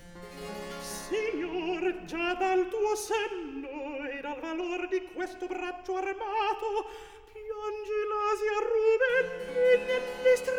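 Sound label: Recitativo, Handel opera; David Daniels; Act 1; Signor, gia dal tuo senno; Handel - Rinaldo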